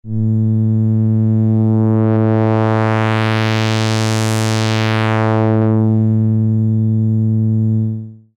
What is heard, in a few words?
MOS6581 LP Filter sweep; SIDizer